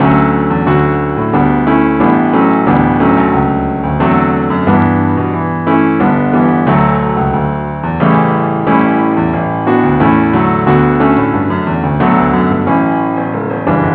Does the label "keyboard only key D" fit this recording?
Yes